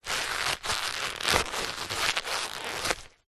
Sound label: leather handbag or pouch handling creaks